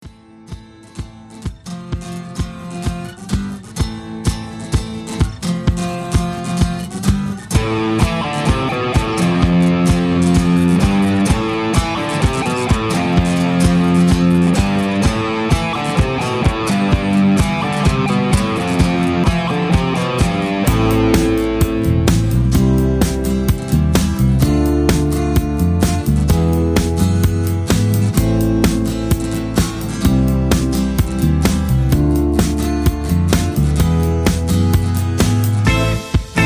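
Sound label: Patter with No Leads No background vocals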